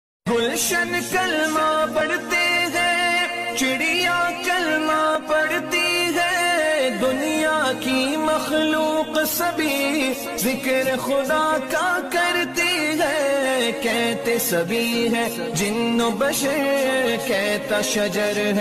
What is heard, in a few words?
naat ringtone